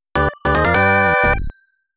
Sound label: Challenging Stage Start Music